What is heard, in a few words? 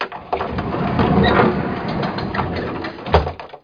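BARN DOOR; outlaws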